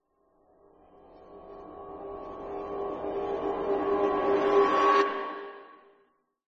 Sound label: horror riser metal reversed rattle